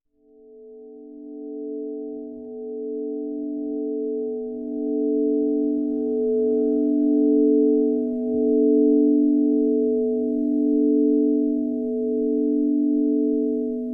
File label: Harmonised Healing Set, crystal singing bowls